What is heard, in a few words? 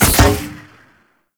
Heavy Grenade Launcher Thunk; Warframe; Archwing